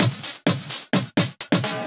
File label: Fill Ins 128BPM; Vengeance Samples